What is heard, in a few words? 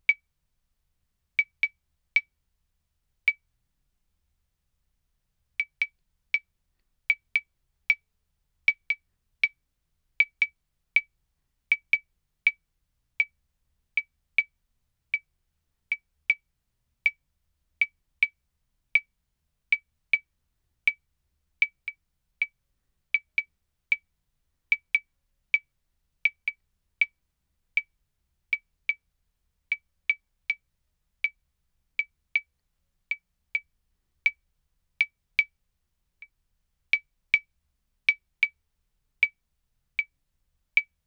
in Eb Claves